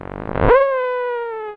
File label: battle talk; hl2; combot